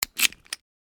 Key insert house door; locks keys

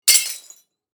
Glass shards drop

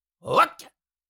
Cartoon little monster voice jump

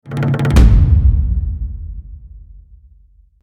Tribal drum impact cinematic hit

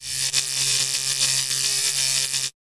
Electricity spark or arc

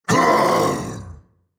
Demon king lord pain damage hurt; fantasy

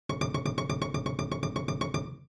Cartoon tiny walk

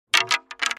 Ice hockey sticks handling

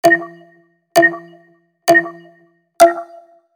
Racing game start countdown; mobile game

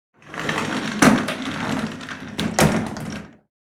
Shower door metal framed glass slide open

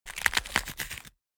Crab fast movement; Fish and Aquatic

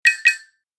Cartoon eye blink

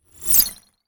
Futuristic swish transition

